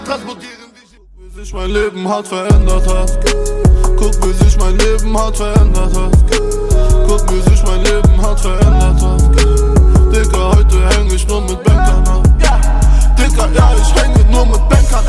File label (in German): deutsche rington